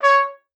STAM C#5, TRUMPET; Session Instruments; Best Service ProSamples vol.42

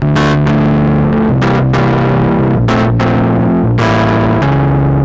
Bass Organ B, 95bpm, analogue circuit; musicradar

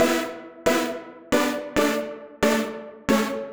136bpm Lines n Loops Synths, uk garage samples

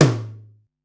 Tom Lo-Mid, Korg Wavestation-SR Drum Kit; Wave 455 - Drum Kit

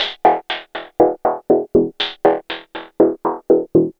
120 MOD GUIT E, Modular Synth Loops; Polyend; Simulacrum Sounds

